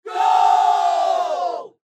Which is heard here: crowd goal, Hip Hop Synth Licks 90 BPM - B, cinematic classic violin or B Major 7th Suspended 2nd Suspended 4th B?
crowd goal